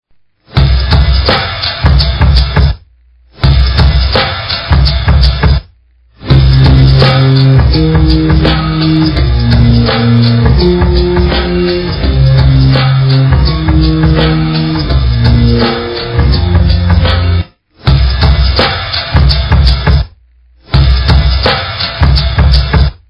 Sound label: drum; Funny instrument Ringtones